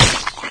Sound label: rat death; guns n zombies 2014 pc